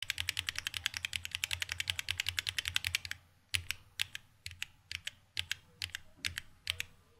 Gateron Switches Sound Test Red Blue Brown Yellow White Black Green